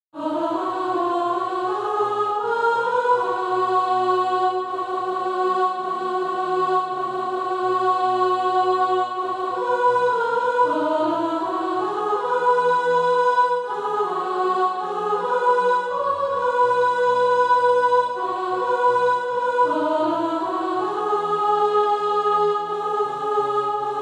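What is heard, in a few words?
soprano; Eternity Somewhere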